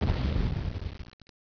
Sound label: delete; Cybernet Exercise Calendar 1998